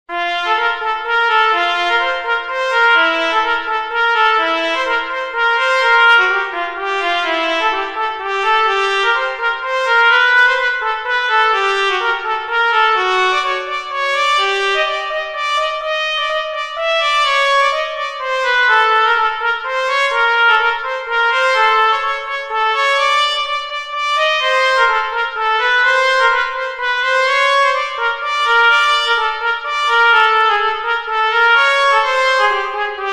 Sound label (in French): trompette téléphon